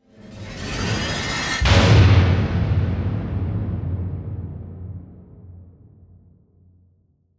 Mechanical Join, Mechanical, Joint, Connect, Digital, Charge, Up, Slam, Transforming, Robot; Production Element, Imaging Element, Accent, Transition; Spacecraft Sound Effects